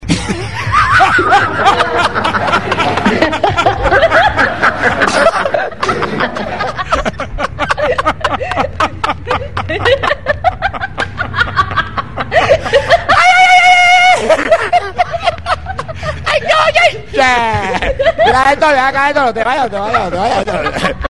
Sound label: hahaha ayayay